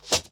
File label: Percussion; Vengeance Samples